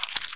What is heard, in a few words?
grenade draw; weapons